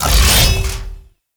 Mech Stab Ability; Nechro Mech; Vehicles

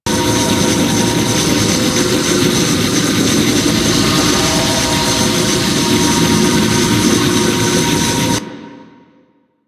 Gas Pipe Loop; Ambience; Props; Warframe; Corpus Railjack

